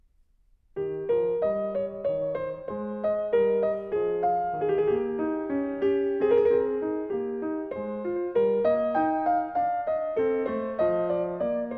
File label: Menuet